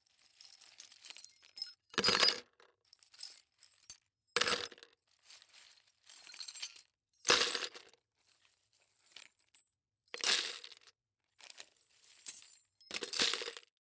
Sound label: Nails Into Bucket, Nails Into Bucket Multiple; Hardware; Industry; Blastwave